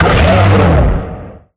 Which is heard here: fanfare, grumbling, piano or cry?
cry